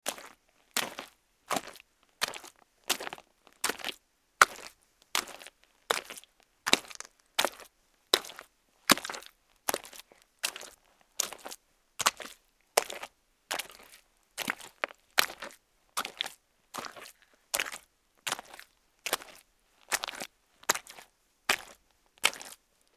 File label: Footsteps Mud Walk